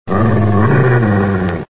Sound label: Wolf Growl; Extra Lives